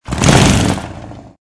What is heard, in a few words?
vehicle moto rev up; mohaa